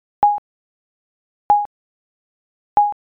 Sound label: ecg